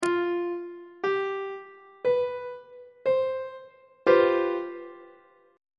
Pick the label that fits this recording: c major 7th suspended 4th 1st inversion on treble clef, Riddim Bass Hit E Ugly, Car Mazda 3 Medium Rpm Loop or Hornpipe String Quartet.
c major 7th suspended 4th 1st inversion on treble clef